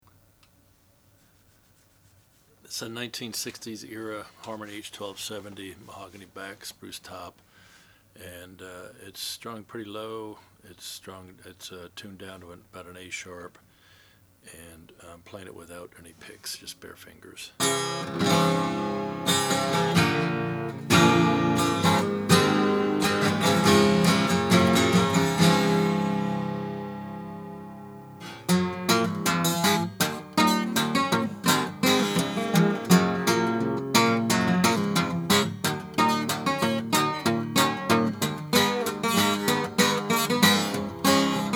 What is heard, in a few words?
harmony h1270 jumbo natural